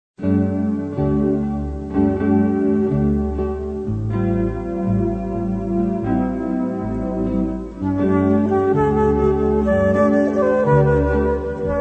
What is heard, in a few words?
duo guitar flute